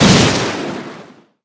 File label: transform whoosh; gwyn